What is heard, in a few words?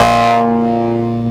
SYN, Drum & Bass, 130 BPM; Ueberschall; Drum & Bass Flash Trackz